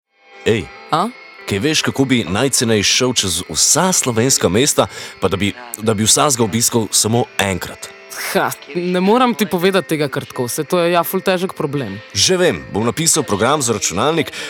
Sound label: jingle; Frequenza; frequenza della scienza